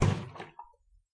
kanisteri, Clangy Crunchy Foundsound Kit

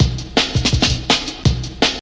165 insect classic, DRUM LOOPS and BREAKS

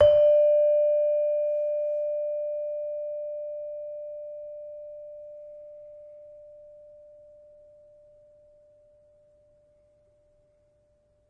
Saron, Indonesian Saron (Multisampled); WORLD

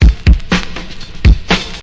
123 partial blockade, drum loops and breaks

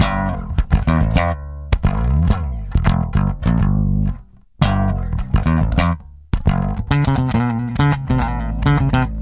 104, bass loops; MELODIC LOOPS

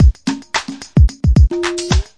110 bendy conga electro, drum loops and breaks; 101 to 110 bpm

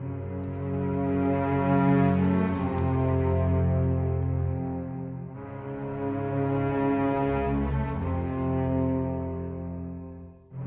090 dead serious orchestra; STRINGS ORCHESTRAL CLASSICAL LOOPS

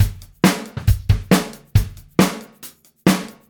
137 Up Gate

